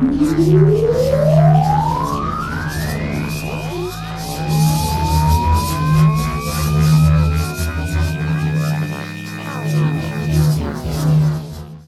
core start, star cores

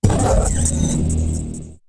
attack, cylarkan, creatures; combat; CosmicRageSounds; chicken fix

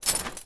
build, ships; ratika

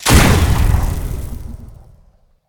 fire; combat; weapons; default shootable

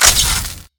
damaged, weapons

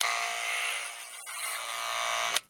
haircut; house

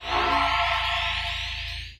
vibrate, star cores; CosmicRage

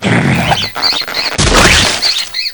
cage; ships Ratika

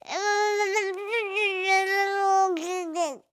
shivering, birthday

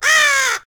raven

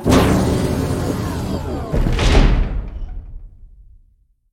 phase close, doors

